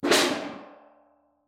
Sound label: metal trash lid bang; soundscrate